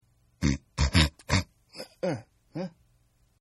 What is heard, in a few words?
Snore Wakeup; Snoring; Human; Snore Cartton 2